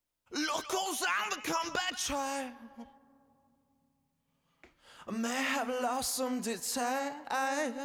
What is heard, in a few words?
Stem Vocal